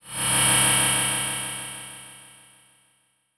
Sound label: Transition Feedback Sweep; Production Element